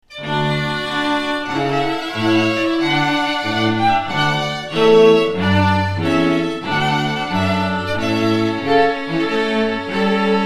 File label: trumpet voluntary, string quartet